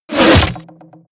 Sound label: ballista hit